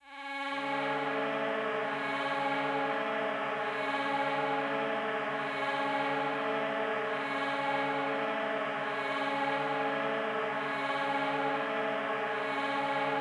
Pad

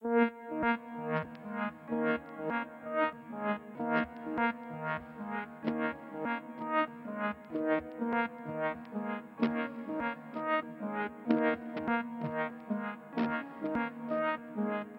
Arp

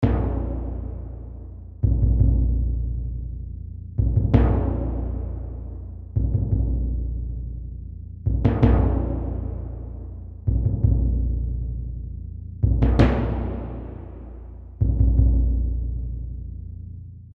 timpani

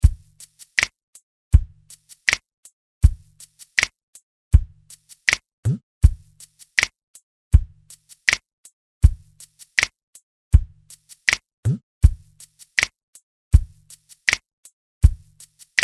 perc

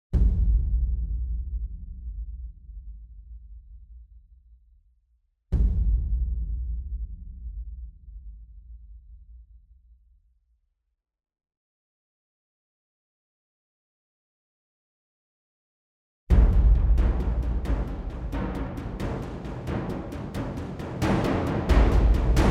perc

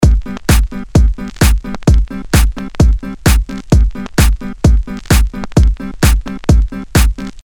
guit bass

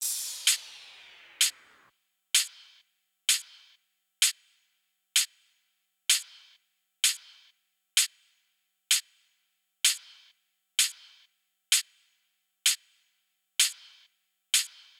Crash x Hats

